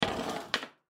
Cookie Sheet Down, Cookie Sheet Set Down Wood Table Metal; Kitchen; Household; Blastwave